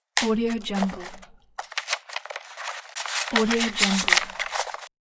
plastic folder file open and important file searching